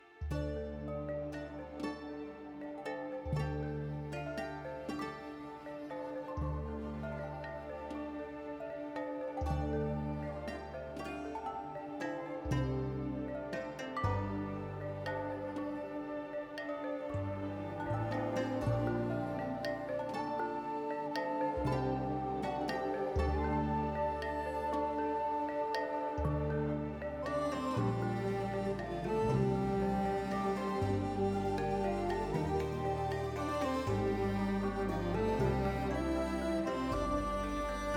mood loop romantic; lol

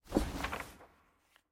sfx trans record collection return click; jinx

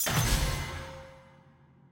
ui button confirm; tft lny2022

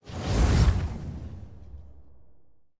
champ grid open; champ select

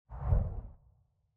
market transition whoosh; tft

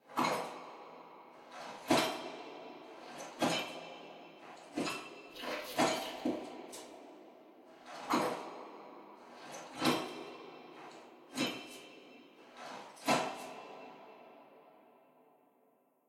walk lunges

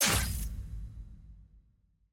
purchase click

